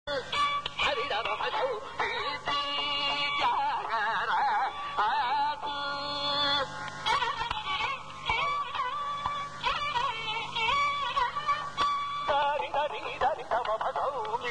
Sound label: thodi swarakalpana; manodharma; dasuko